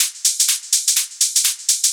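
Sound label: hihat loop 125 bpm, Percussion Loops, Hihat & Filter; Houseworx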